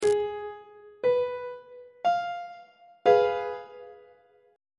e sharp diminished 1st inversion on treble clef

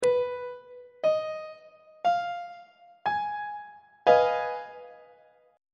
g sharp minor 6th 1st inversion on treble clef

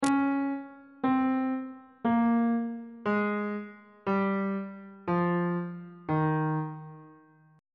d sharp mixolydian mode descending

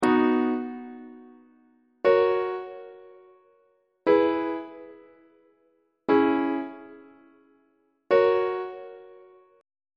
c flat major half cadence on treble clef

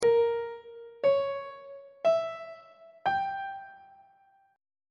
b flat diminished 7th chord intervals; on treble clef

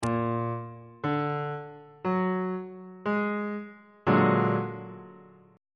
b flat augmented 7th chord on bass clef

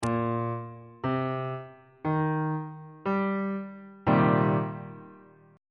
b sharp minor 7th 3rd inversion on bass clef

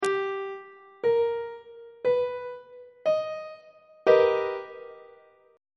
c flat augmented major 7th 2nd inversion; on treble clef

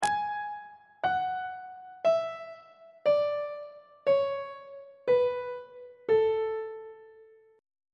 a major scale descending on treble clef

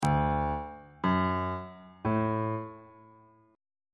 b dorian chord 3 is d major

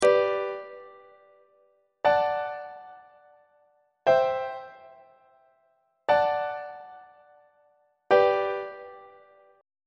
g major perfect authentic cadence on treble clef

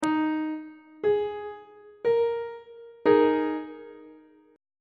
e flat suspended 4th chord; on treble clef

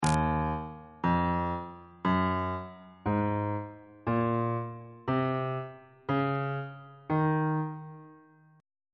e flat dorian mode

